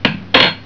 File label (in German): KLONCK